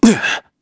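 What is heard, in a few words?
Human Jump, Korean; heroes; Shakmeh; kings raid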